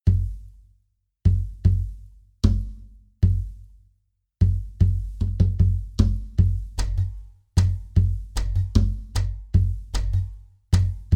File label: groove